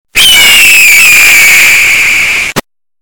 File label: Eagle Sound Effect; Meme